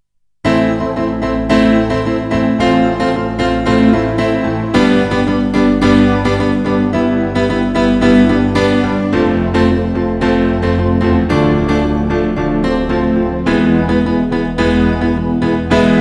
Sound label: Chorus Piano off